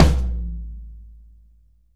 tom, drum

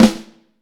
FLAM, Snare Drum; Vintage Snare Drum; 7.5X14; Q Up Arts; Johnny.C's Vintage Snare Drum

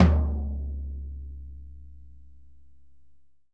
Floor Tom mf, Drums; PX7; Digital Sound Factory Protean Drums

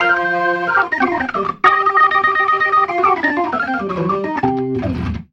RIFF, ORGAN RIFFS; InVision Interactive

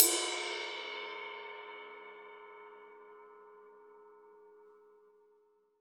RAW RIDE, Drums Acoustic; AKAI; 90s sample CD; USB Soundscan vol.10; RAW KIT 7

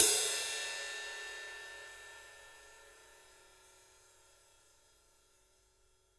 RIDE, Drums Acoustic; USB Soundscan vol.10; AKAI; ALL RIDE

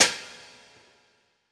Hi Hat One Shot; Sonic Foundry; Essential Percussion

